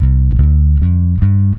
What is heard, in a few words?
Electric Bass; Sonic Foundry (Sony Creative Software); Knutrix Drum & Bass